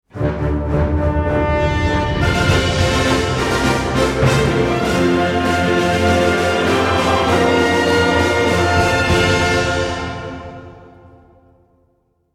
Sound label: victory; Kameo; Battle Field; talon